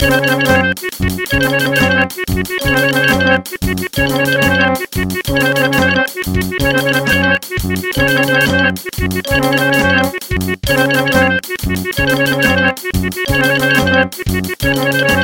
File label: Boss Music